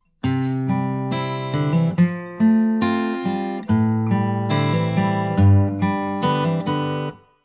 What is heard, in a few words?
guitar Arpeggio